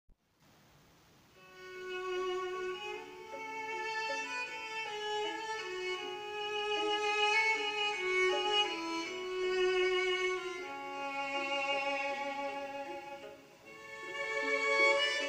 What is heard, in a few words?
String Quartet Sample